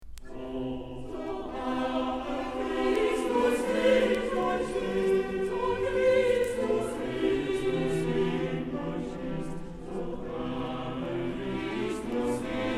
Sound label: Bach Motet; Bachchor Stockholm; BWV 227 VIII